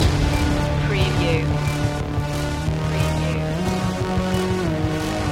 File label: the breach loop